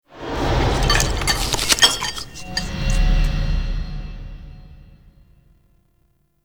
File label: Eating Stinger; Warframe; Duviri